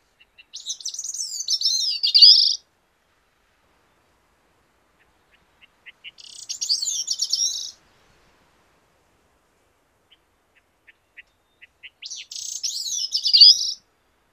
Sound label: Bicknells Thrush Catharus bicknelli